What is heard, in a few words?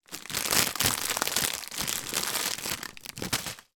food cracker packet movement long